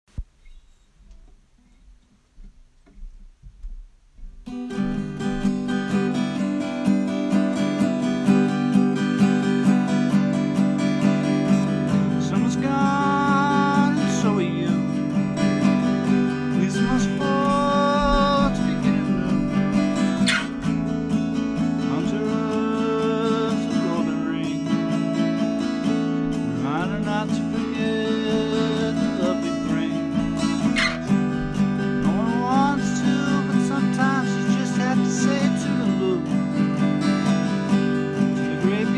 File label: acoustic